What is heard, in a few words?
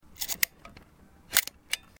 nikkenflex, camera